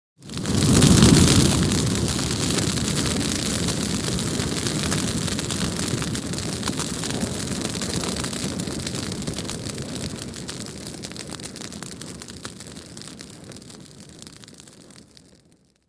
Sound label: FIRE FLAME: EXT: Long fire bursts; Flame Exterior; slow crackle die down.. Fire Burn.